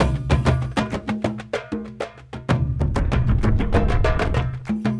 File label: Dunk A Boong, Drums; Sonic Foundry (Sony Creative Software); Friendly Fire; Marc Steve